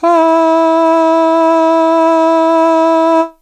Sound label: haaaaa, Voice